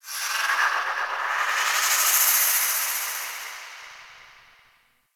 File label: Moon Wind Small F; Ambience; Warframe; Orokin Moon